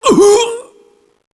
oooogh

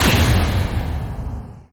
door close

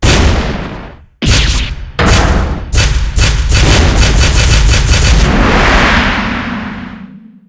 lights end; breakdown

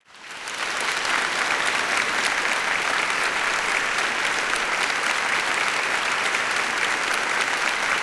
gallery applause

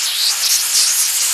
gasping

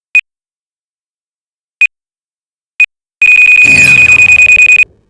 bomb

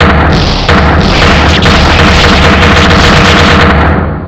breakdown

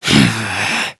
sigh; clive

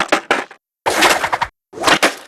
skateboard; bucky

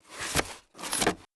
flip; behleeb

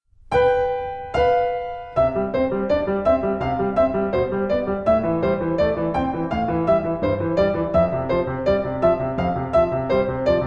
piano

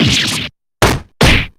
damage

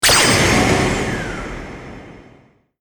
transformation; mystery room; alfendi

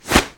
phone

